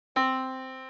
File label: yamaha Disk